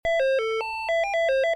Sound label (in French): Téléphone